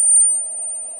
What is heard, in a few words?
40 cm (Holding 0.25L) Longitudinal, Shrieking rod, Brass Rod; georgia 2010